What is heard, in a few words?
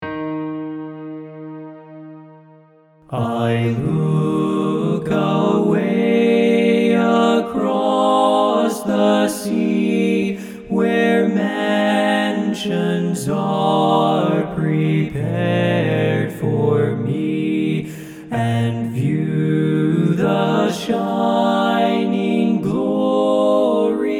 LEAD